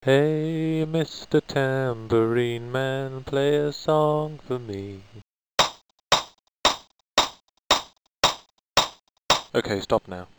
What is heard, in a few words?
tambo